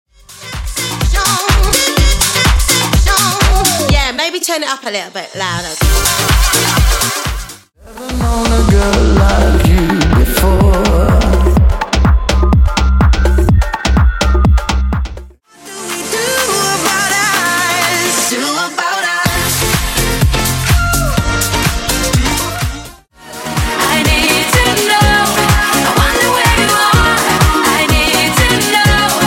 party dance house megamix 125 bpm

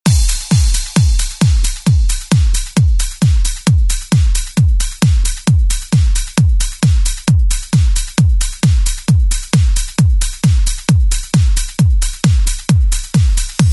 90s ReDrum; DJ Mhark

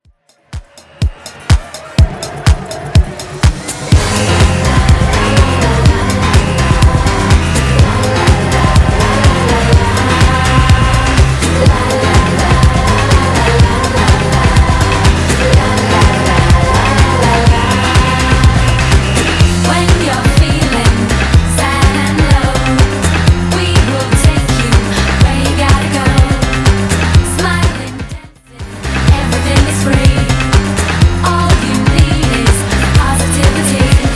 90s Redrum 124bpm

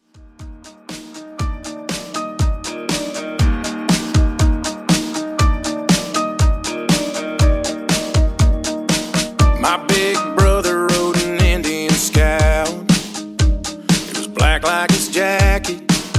Country Redrum 120Bpm

